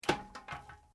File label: WOOD STICK MOVEMENT MINOR; Material components for constructing more complex sounds; Materials